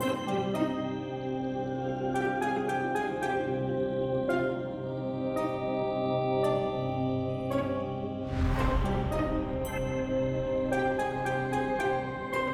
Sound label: Spirit Funny Music; Robbie Swifthand and the Orb of Mysteries; PS4